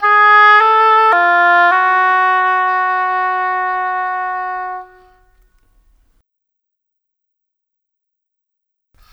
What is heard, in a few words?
Cinematic Oboes; Sonic Foundry (Sony Creative Software); Orchestral Series VOL-3 - Cinematic